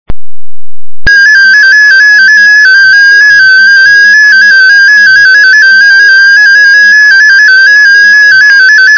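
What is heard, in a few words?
DominoEX, digital mode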